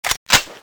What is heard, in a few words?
mp40 bolt pull, weapons; csco; csgo